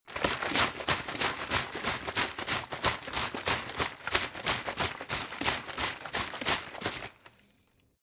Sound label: bottle water sparse shake slow; gastronomie; berufe